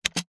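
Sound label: computer spacebar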